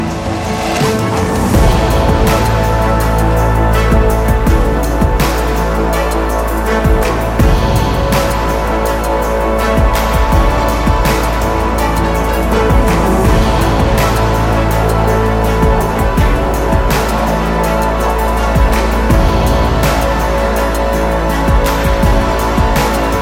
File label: sunday train (instrumental)